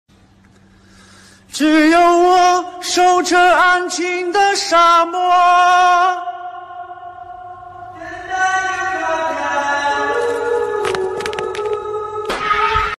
chinese man singing into a hole

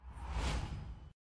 news flash transition sound effect

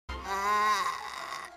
uhhh; yakkos

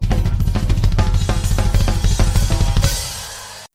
epic drum rollz

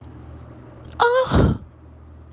ahhh hh

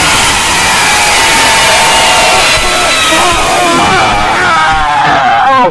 jumpscare; trtf 4

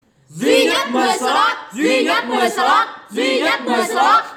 slot

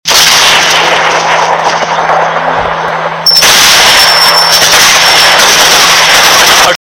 gunshots

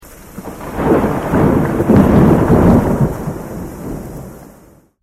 thunder

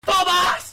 beatboxing

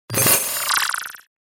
glitch

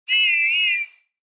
whiffs whistle

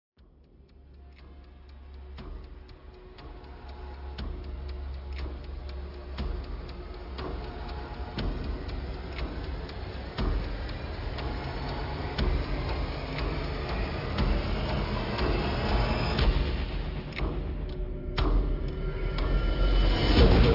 suspense sound

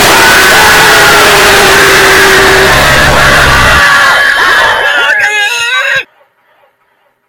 jumpscare

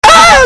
scream

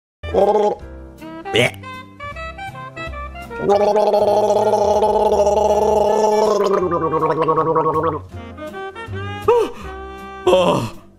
gargle

